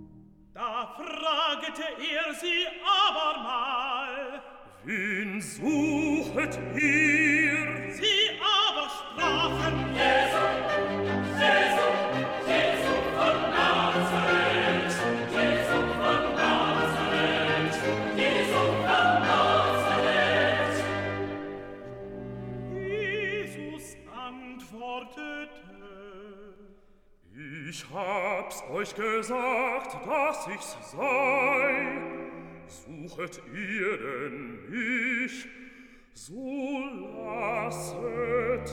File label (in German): BASS, Stimmen mit Klavier; Johannes Passion